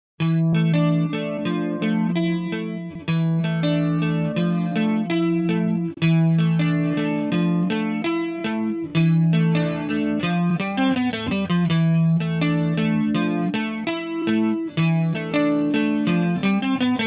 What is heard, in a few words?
clean gt; Kytara